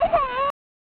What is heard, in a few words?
Crying; Bishoujo Senshi Sailor Moon; snes; VFX 05 Sailormoon